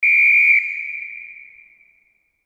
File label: whistle